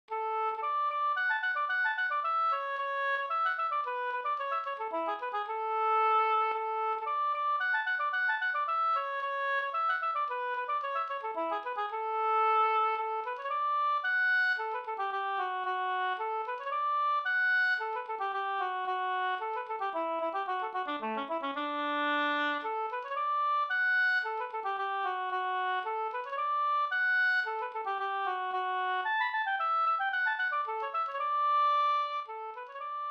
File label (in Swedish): polska Bingsjopolska polska; Pekkos Pers lilla polska e Pekkos Helmer